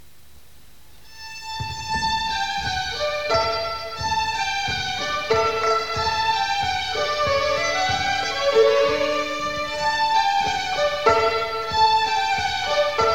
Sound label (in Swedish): Vävmazurka; Bygdsiljum